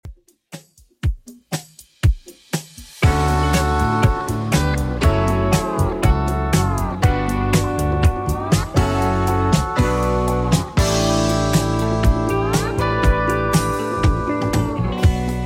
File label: 80s Redrum 120bpm CLEAN